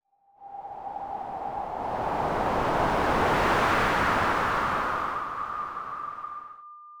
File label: resonator